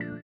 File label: VIBRA; Spectrasonics; Metamorphosis